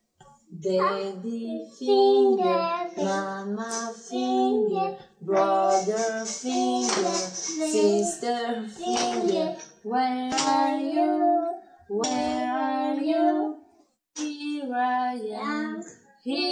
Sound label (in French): comptine anglaise